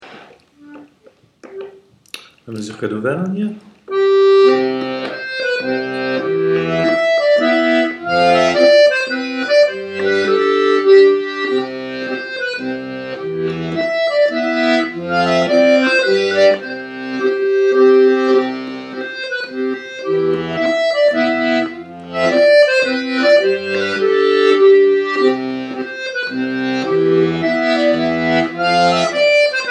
Mazurka d'Auvergne, accordeon

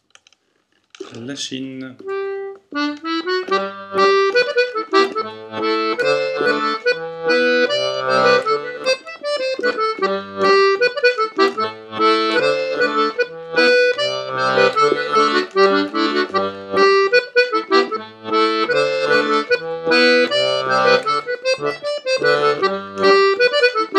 accordeon; Lachine

